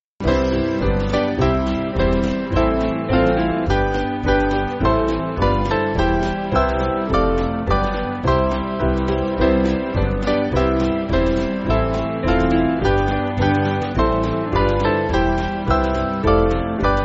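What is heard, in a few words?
Band, Music Backing Files for Churches; He Is Risen; Neander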